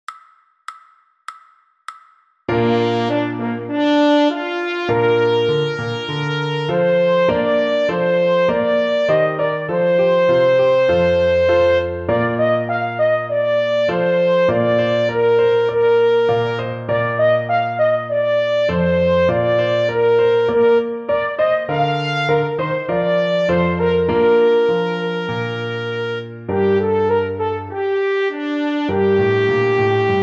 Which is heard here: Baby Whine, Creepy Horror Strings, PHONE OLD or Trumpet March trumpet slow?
Trumpet March trumpet slow